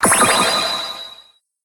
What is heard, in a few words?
wild symbol add to spinning reels; koi princess